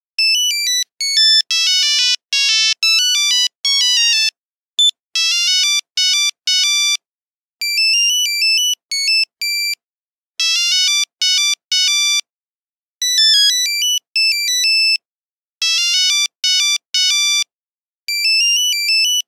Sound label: Nokia 1100 Entertainer, Nokia Buzzer; Windows Phone apps